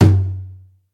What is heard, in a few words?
mid tom sound f sharp key, Royality free tom sound tuned to the F# note. Loudest frequency: 296Hz; tom drum samples; one shots